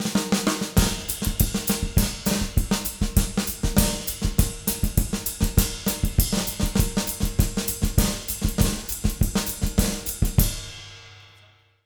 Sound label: 200 SAMBA, Jazz Latin Drumloops